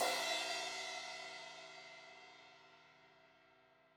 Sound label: Crash 16in edge, Cymbals; Deeply Sampled Drums